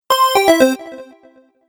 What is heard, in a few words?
Stage Level Complete, Video Game Sound Effects